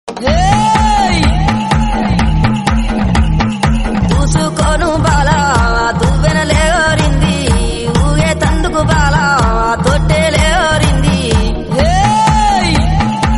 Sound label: devotional telugu